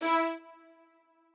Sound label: strings